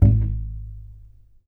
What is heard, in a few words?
TUB BASS G#1